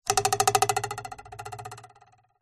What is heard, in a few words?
cartoon ruler twang metal